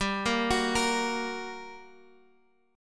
G Minor Major 7th, down; GmM7; arpeggiated

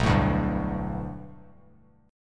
B Minor 7th Sharp 5th B Bass Standard, chord; 7,12,0,0; Bm7#5 strummed; JGuitar

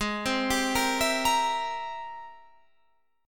Ab Augmented Major 9th Ab Guitar Standard; arpeggiated; Ab+M9; JGuitar

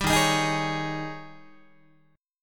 D sharp 11th D sharp, Guitar; strummed; JGuitar

